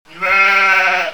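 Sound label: Ewe coo, cucù con gli animali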